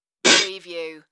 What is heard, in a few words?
Clank Hit